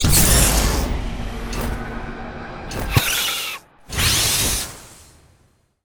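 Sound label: Mech Griffin spawn; monster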